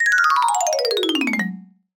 comedy marimba descend; sound effects one

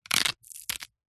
horror bone break snap twist or crush; zapsplat

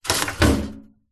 household toaster set push down slight room tone; zapsplat

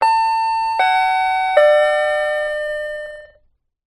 door bell ding dong; the sound pack tree

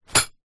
tent pegs in pouch down on ground; foley

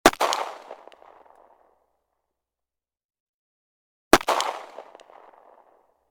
gun bersa; still north media

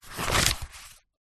household book paperback page turn; zapsplat

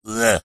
human male middle aged says "ugh"; zapsplat

